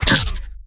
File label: Energy Short Sword